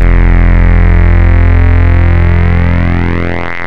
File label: Saw Dual; Basics; Vector Sprite; Ableton Live 12 Lite; Sprites